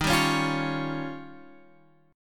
D sharp 9th D sharp, Guitar Open F; D#9 strummed; JGuitar

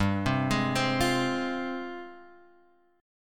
F Minor G, Fm/G chord; arpeggiated; JGuitar

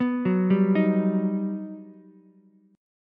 G Augmented Major 7th, Ukulele; G+M7 arpeggiated; JGuitar

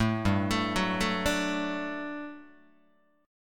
G Major 7th Suspended 2nd G down Guitar; GM7sus2 arpeggiated

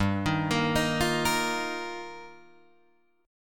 G Minor 7th Sharp 5th, Guitar, Drop D

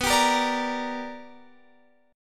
B Minor Major 7th Sharp 5th Guitar Open F; strummed; JGuitar

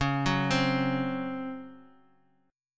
C sharp Major 7th Suspended 4th C sharp; C#M7sus4 arpeggiated; JGuitar

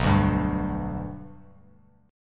B Minor Sharp 5th B Bass Standard, chord; strummed; JGuitar

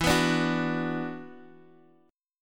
E 7th Suspended 2nd, Guitar, Standard; strummed; chord; JGuitar

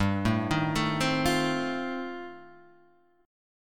F 7th G, F7/G chord; F7/G arpeggiated

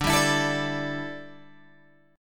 D Suspended 2nd Suspended 4th, Guitar, Open G

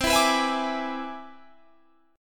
C Augmented Major 9th

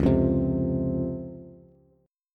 Db Major 7th, Bass; strummed; chord shape; x,4,3,5; JGuitar

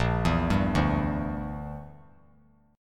Bb 6th Flat 5th Bb; Bb6b5 arpeggiated

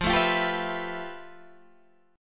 F Major Sharp 11th Guitar Half Step Down

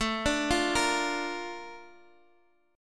Bb Major; Bb/A arpeggiated; JGuitar

